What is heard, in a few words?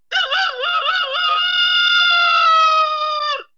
rooster